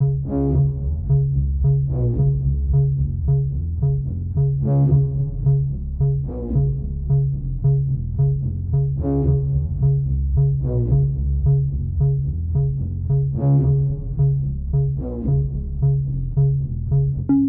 synth

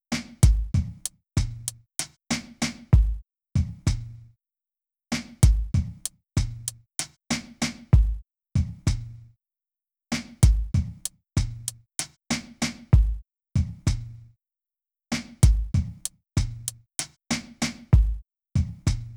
drums 96bpm

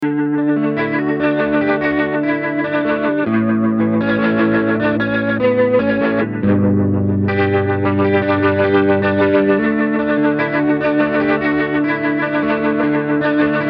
Rotary Guitar; admiralbob77

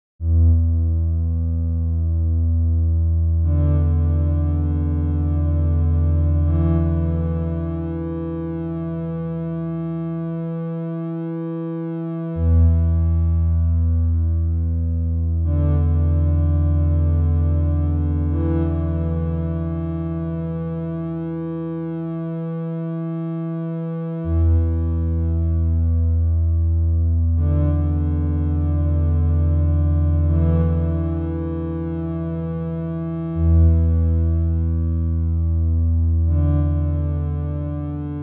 Em80 synth

